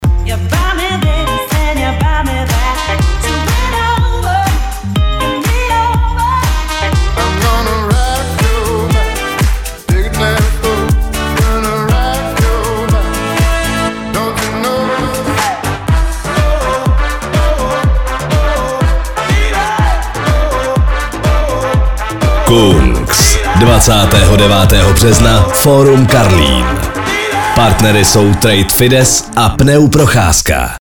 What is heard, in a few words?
music